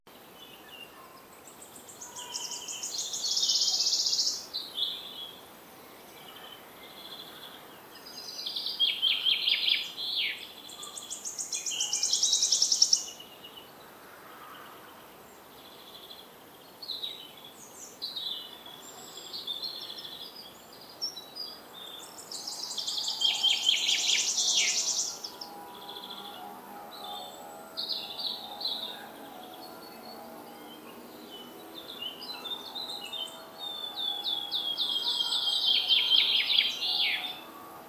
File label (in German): Vogelgesang